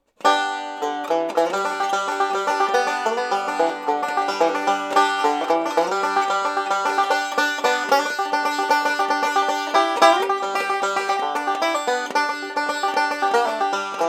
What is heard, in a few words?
Banjo